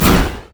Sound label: arcane barrage; LoL; ironforge xerath